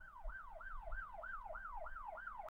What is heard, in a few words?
Amb Generic; Dirt 4